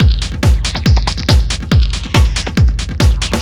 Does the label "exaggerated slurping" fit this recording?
No